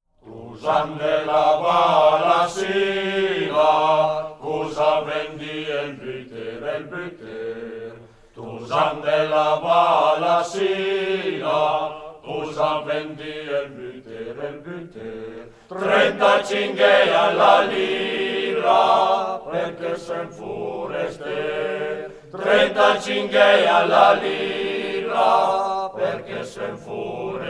tusan de la val asiva (coro); brianza

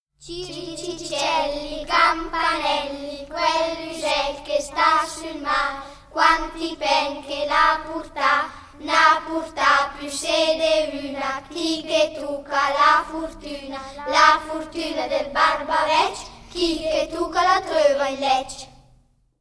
ciricicelli campanelli; brianza

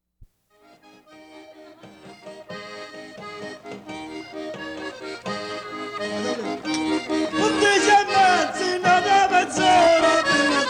Stornello con Tarantella; Vallatese; Tarantella Montemarinese

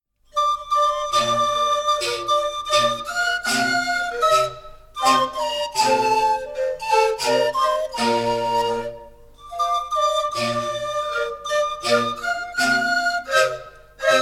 flauti pan; brianza; cara mama mi sun stufa

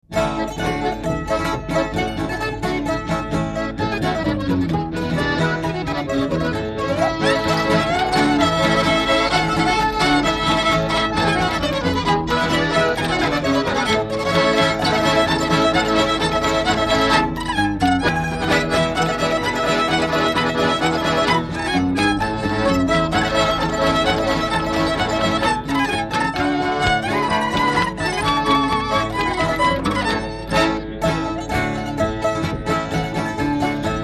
PASSO DOPPIO ALLA FRANCESE

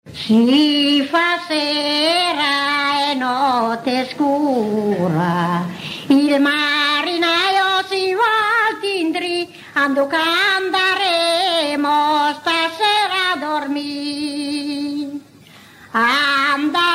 canti; si fa sera e notte scura

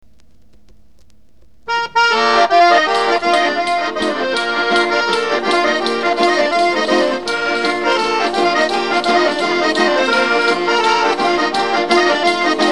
TARANTELLA; calabresi

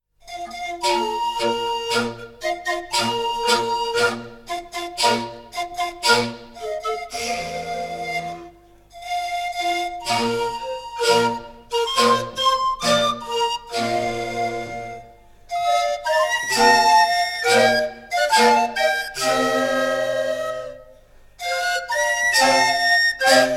flauti di pan; brianza

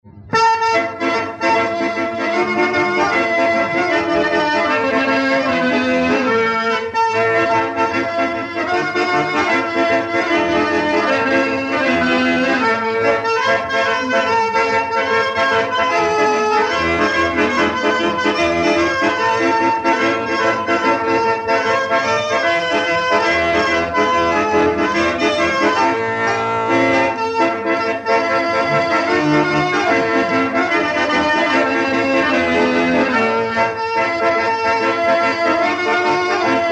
TARANTELLA

